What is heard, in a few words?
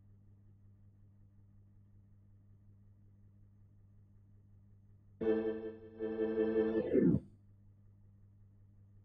Hammond